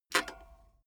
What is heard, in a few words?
bmx bike pedal foot release heavy; sport freizeit